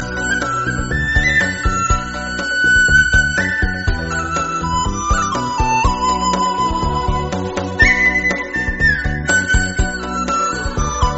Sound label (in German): Chinesische Volksmusik